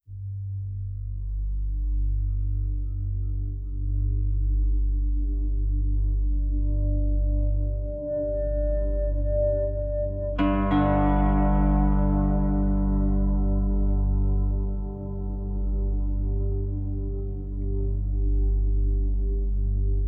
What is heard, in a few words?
Gravestone Ambient; WildStar; Ghosts and Grit